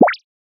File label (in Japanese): ぴょこ